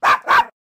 bark

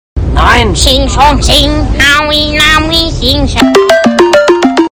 chinese ritual

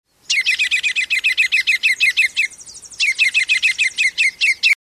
ruby crowned kinglet cry

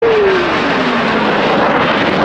f 15 strike eagle military jet

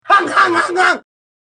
honk

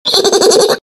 funny laugh

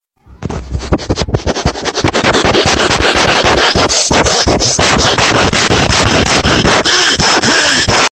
rough sniff

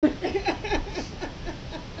haha

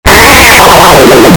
massive diarrhea

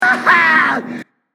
haha; hyde

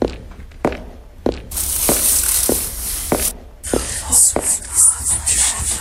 walking leaves whisper

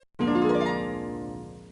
solitaire sound; spider solitaire

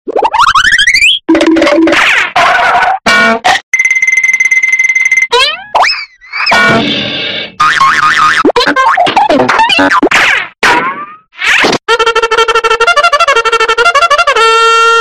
goffy ahh sound

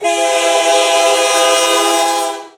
train horn

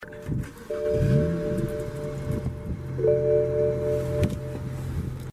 old bmw start

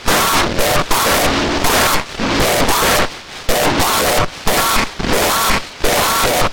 quacks

